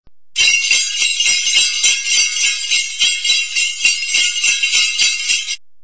sleigh bells; ringtones

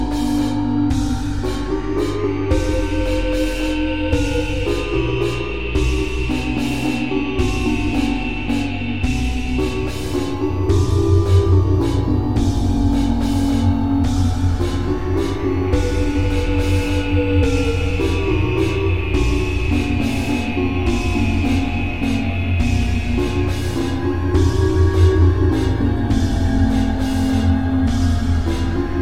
Calliope

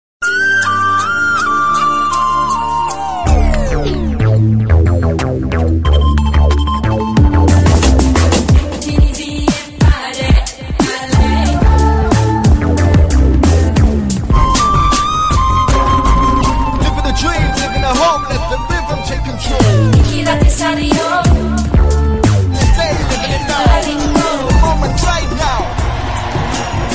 sms, jazz

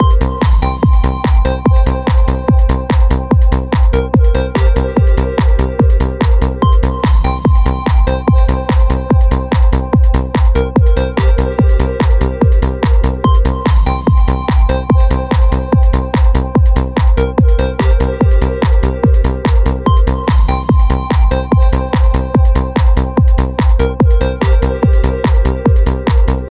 Beat

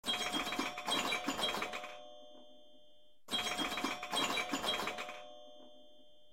iphone pinball, sms; ringtones

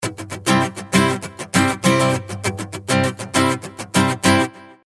Rithim Guitars

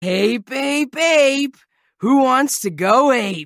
Acoustic

